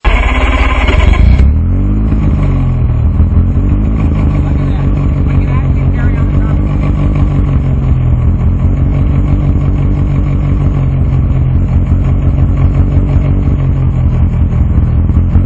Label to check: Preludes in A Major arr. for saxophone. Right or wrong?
Wrong